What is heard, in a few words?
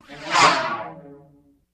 Ghost By High Pitch